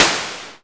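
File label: snare drum, Simmons SDS8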